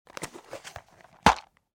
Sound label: CONTAINER PLASTIC